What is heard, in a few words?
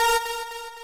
lead a#4